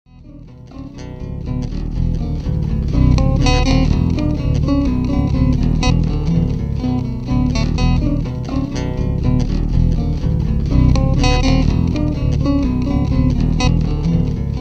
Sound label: bass